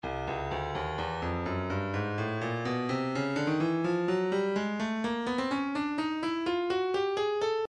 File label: breakbeat 063 bpm; seep d; Stylus Breakbeat Midi Files